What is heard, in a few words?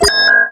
sentry spot